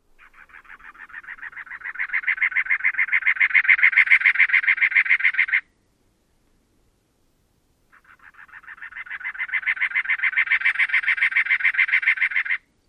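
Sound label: song, Cactus Wren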